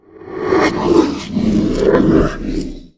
挑衅, 鬼,幽灵; Enemy

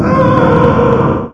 有人的喊声; Enemy

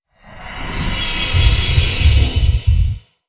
恐怖环境声; Environment

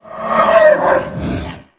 受伤; Enemy; 鬼,幽灵

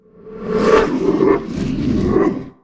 攻击, 鬼,幽灵; Enemy

